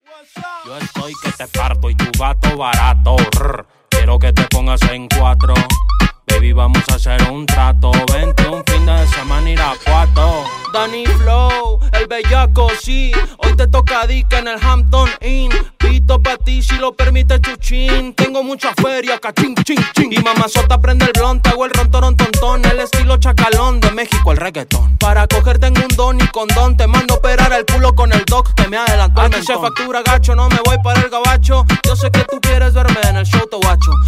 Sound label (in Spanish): REGGAETON